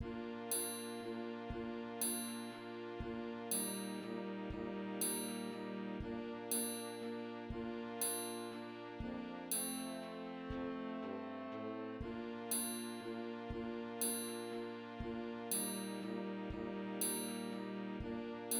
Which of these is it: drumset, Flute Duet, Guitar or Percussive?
drumset